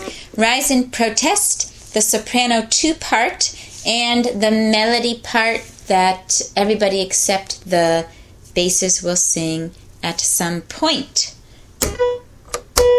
soprano II

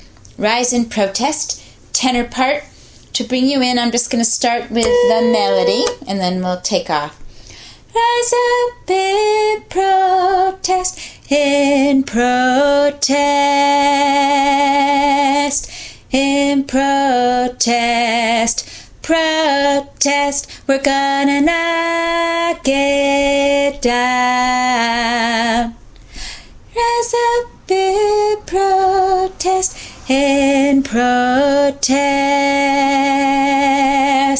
tenor

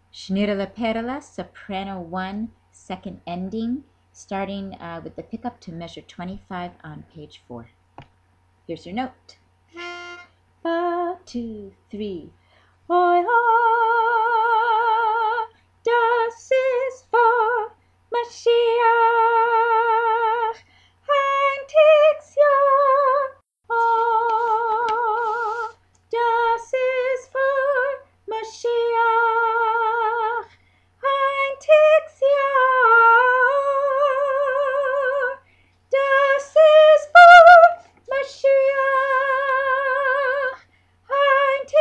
shnirele second ending soprano I, chorus; Shnirele Perele

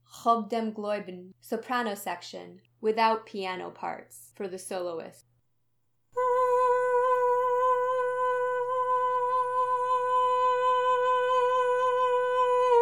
soprano no piano; vocal only; khob dem gloybn

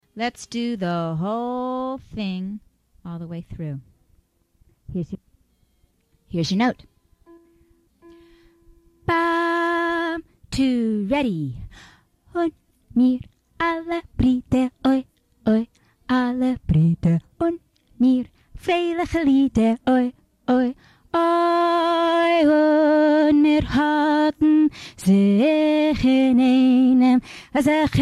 Tenor Runthrough; all the way through; Ale Brider

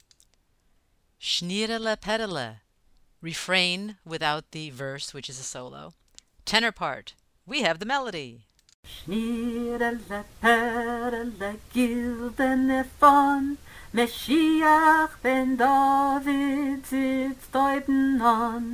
tenor; Shnirele Perele

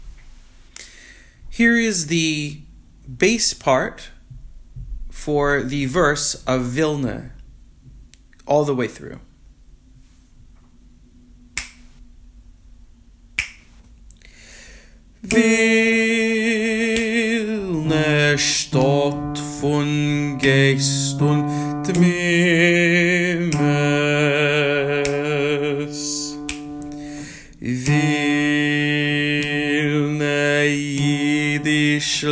Verse Bass Part; Vilne

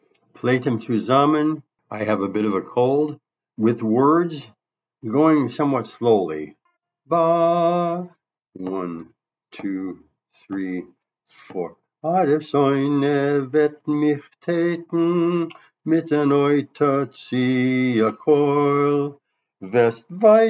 words MONO bass; A to D; Playtem

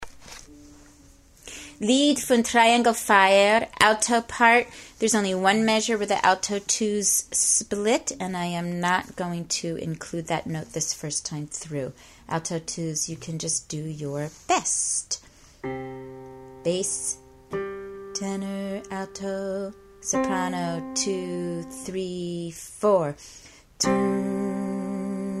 alto; Lid Fun Trayengl Fayer

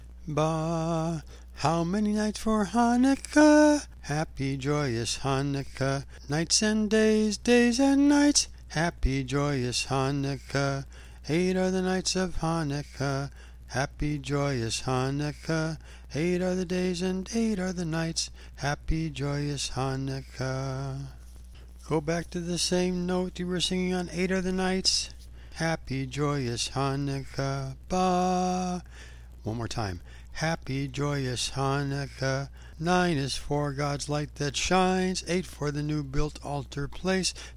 Alto, chorus

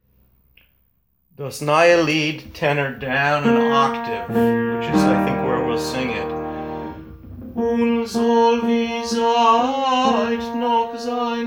tenor, chorus

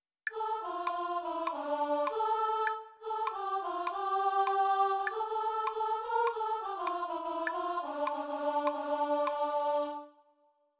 English Verse

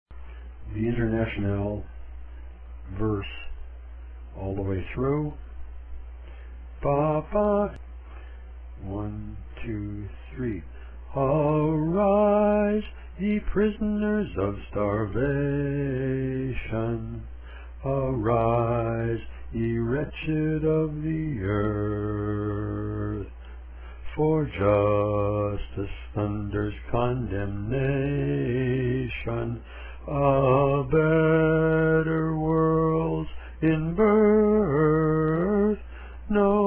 The Internationale bass

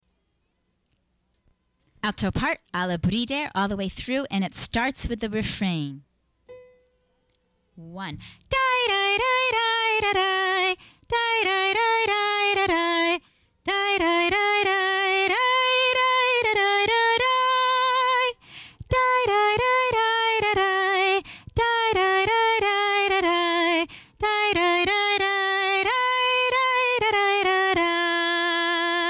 Alto Runthrough; Ale Brider

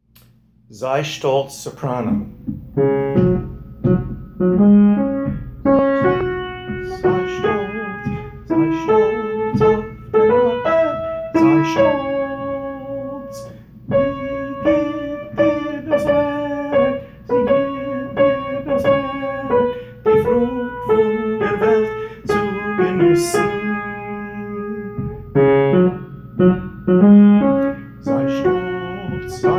soprano; Zay shtolts

